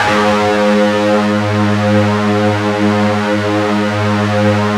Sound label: FUZZ G#1, Guitar; East West; Steve Stevens Guitar Samples Collection